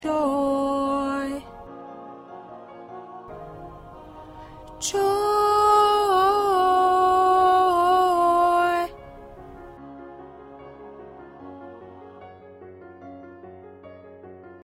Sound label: Backup Soprano